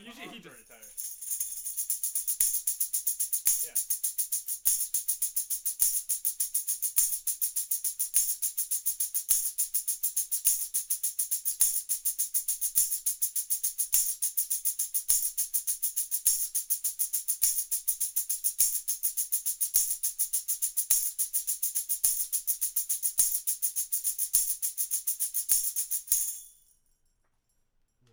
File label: Tambo